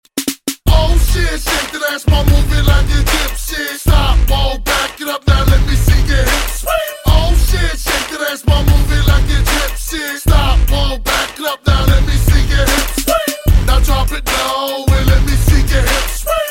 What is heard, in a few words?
swing